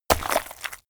mud water splash impact; berufe; soldat

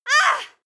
female moan pain short; koerpergeraeusche; menschen; soundpool

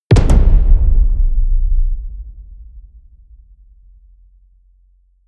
drum hit loose large, percussion

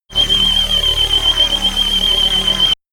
shot aching tooth low; bewegung; comedy-cartoon; soundpool

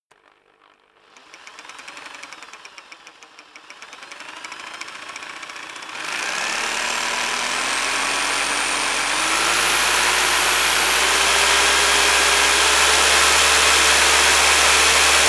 rock drill hammer speed up heavy; maschinen; bohrer; soundpool

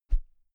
whoosh thick low; swooshes-swishes

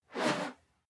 whoosh whistle slow

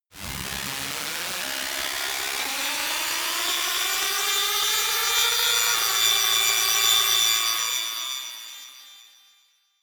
glitchy crackle noise long, sciene fiction

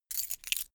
key ring large put down; objekte; schluessel

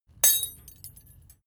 metal tools small drop; berufe; handwerker; soundpool

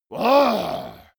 monster growl aggressive short; kreaturen

